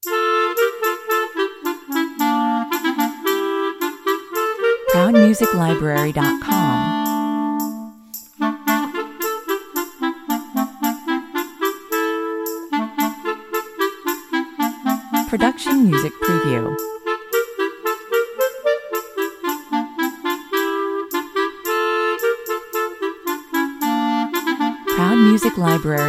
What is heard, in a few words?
god rest ye merry gentlemen (woodwind)